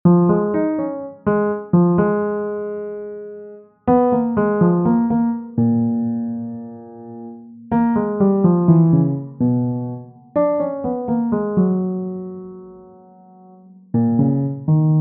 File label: bossa nova